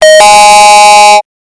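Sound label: yahoo message error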